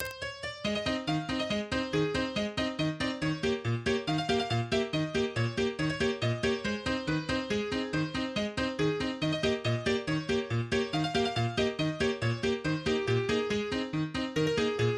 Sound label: Squeezebox